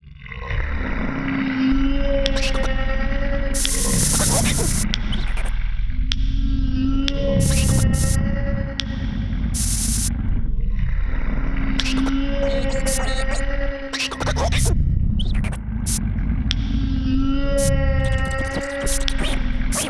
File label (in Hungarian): vokal etud